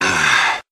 "ah" relief, Voice; ooh ahh

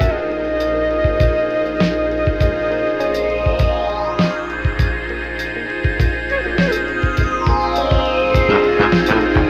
flute and keys, Loops Phonky

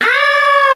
"ah", pain; ooh ahh

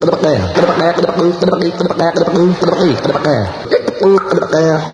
garggling, Voice

